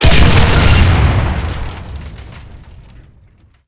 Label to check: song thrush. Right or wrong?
Wrong